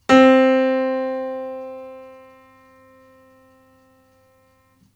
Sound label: Middle C, piano; Middle C piano keyboard